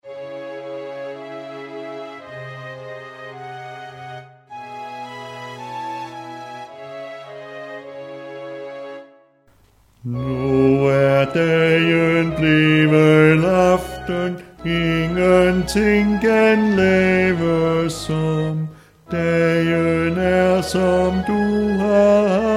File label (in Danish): Med vokal cis mol; Nu er dagen blevet aften.